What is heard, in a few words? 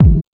BD SUB; drum; BASSDRUMS